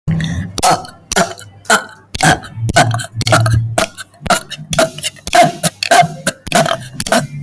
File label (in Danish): bøvs